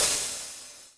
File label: CYMBAL, Yamaha RM 50